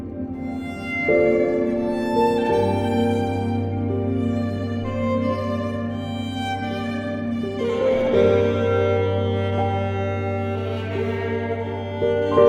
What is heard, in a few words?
airy